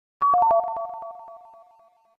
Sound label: Weird Route Jingle; Deltarune